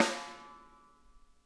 snare, drum kits

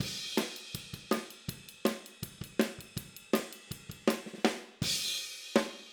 Hi-Hat, Drums, 162 BPM; Take Your Toms Off

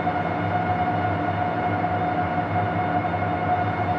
InfiniVerb Noise, sparse soundscape Loops

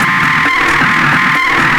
FX BEAT; Big Beat Drumloops; FX BEATS; AKAI

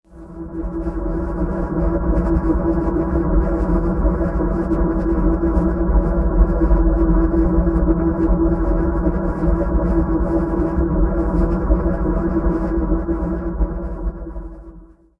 Pillar Drone E; Zero-G Seismic Frequencies; Ancient Drones; 90 s Sample CDs

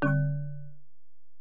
Bell, Alesis DM-PRO

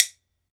wood bone, Ireland; World Combos; E-MU Producer Series Vol. 7 – Old World Instruments

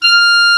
Clar mf F5; Digital Sound Factory Virtuoso

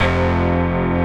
PPG sync F2, PPG Wave 1; Greytsounds VOL-1

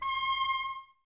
OBOES C, Oboes, Wood Winds; Miroslav Vitous - Woodwind Brass Ensemble

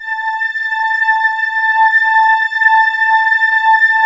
Prophet VS, A5, Vintage Keyboards; Big Fish Audio - First Call Vintage Keyboards

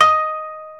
KOTO D#4; World Combo

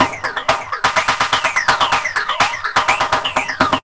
flange breakdown, Deep House, 125bpm

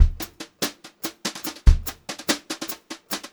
Brushed Drum Loops; USB Soundscan vol.39; AKAI

